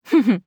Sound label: laugh; Eternal Return; Hisui; JPN